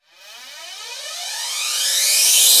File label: reverse, FX; Vengeance Samples